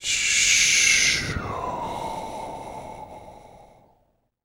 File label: BRTH, BREATH FX, Jazz FX; Spectrasonics; Vocal Planet